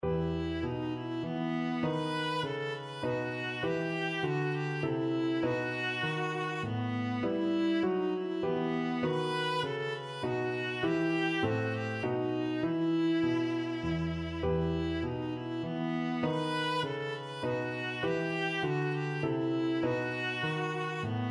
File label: air, viola; purcell